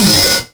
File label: SOUND EFFECT, Metal; AKAI S6000 CD-ROM - Volume 1